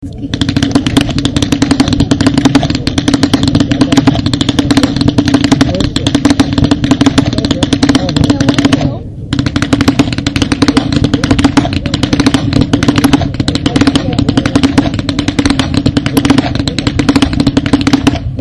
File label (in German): schnalzen; anthering